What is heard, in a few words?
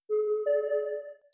game over; Business skieme Beta 1